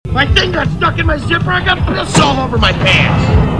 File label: zipper